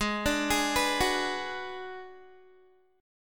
Ab Minor 7th Flat 5th Ab, down, Guitar, 4E, 4A, 5D, 5G, 5C, 6F; Abm7b5; arpeggiated